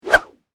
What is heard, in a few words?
Whip Whoosh Extension, Foley, Whip, Whoosh, Extension, Cable; Whips; Objects; BlastWave